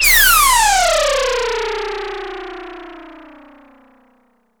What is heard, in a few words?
SPACESIP BUY; AKAI S6000 CD-ROM - Volume 6